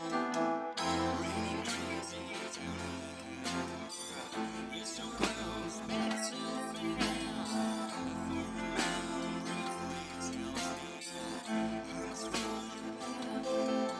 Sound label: piano; SiSEC11